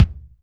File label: Kick, Alesis DM5; Drums